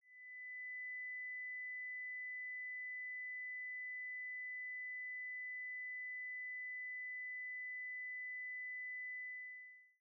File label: 2000hz; online hearing test